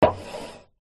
cola can open full set down slide table; food drink; zapsplat

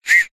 whistle blow weak broken mostly breath noise; foley

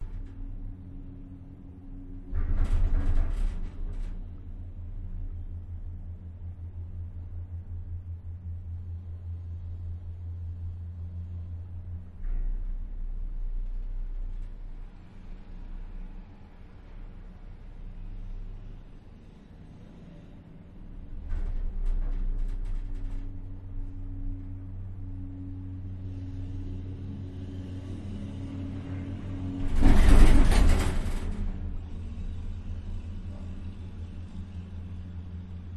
teleferico ride cablecar; la paz; your free sounds

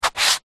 footstep sneaker scuff movement paved ground; foley; zapsplat

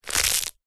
gore small bone break crunch

